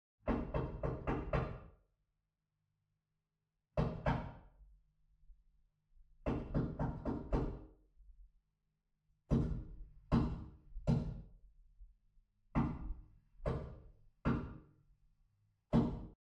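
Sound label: door knock interior glass front door knock distance mono; Sonniss GDC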